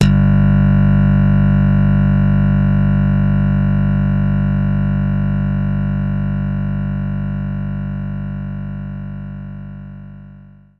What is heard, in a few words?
Slap Bass G2